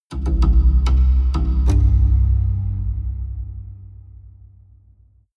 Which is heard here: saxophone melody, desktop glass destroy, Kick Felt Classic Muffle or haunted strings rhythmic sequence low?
haunted strings rhythmic sequence low